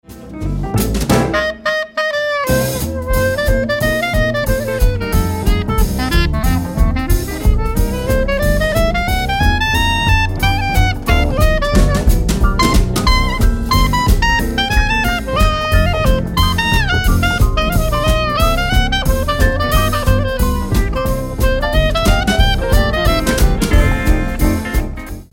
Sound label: clarinet